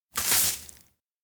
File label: classroom cleanup; anemoi